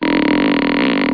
stretch

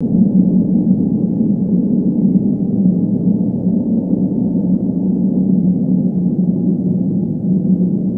wind

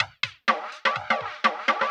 PERCLOOP HOUSE 125; eJay

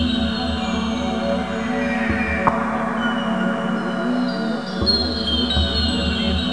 bol ambient

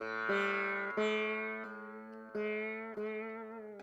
SITAR ETHNO 125; eJay; Guitar

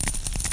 open; MoffCalc

